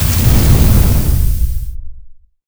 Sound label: ambience prime laser impact hiss